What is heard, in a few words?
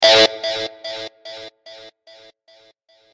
Guitar A